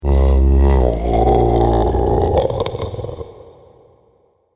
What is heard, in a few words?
Zombie Garbled Groan; Zomby Sound Effects; Horror Sound Effect